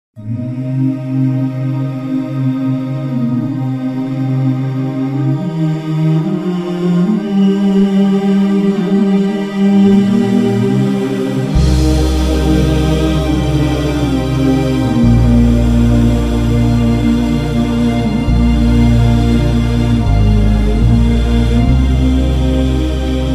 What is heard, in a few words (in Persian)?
Vocal Shoor